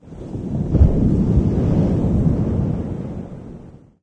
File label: distant wave, water; Garry's Mod; hl2